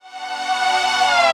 Synths F#; vengeance essential club sounds-3

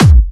Bassdrums Clubby Kicks; Vengeance Samples

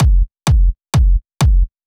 Kick, 128 BPM; Vengeance Samples; VTDS2 Song Kits

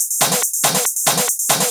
Loop 140 BPM; vengeance essential club sounds-3

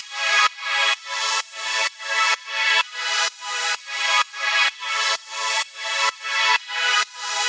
Special Sounds Root C# 128 BPM; Vengeance Samples; VEH3 Special Sounds

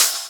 Cymbals HH Open; Vengeance Samples

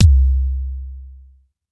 Bassdrum; Vengeance Samples; VEE Bassdrums

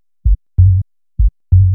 Melody Kits 128BPM Root G; Vengeance Samples

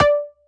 Synth Cuts; Vengeance Samples; VES1 Instruments

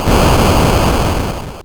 Arcade Riffs

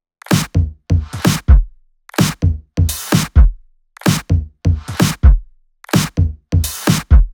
128BPM Kit; Vengeance Samples; Mars Kit

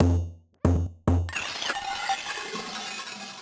Loop 140BPM; Vengeance Samples; VTS1 Bonus Sounds

